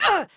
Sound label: hit; NWNDS; Edwina